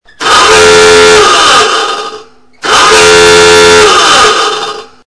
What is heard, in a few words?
klaxon